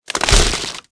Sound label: wood fence common; World of Tanks Blitz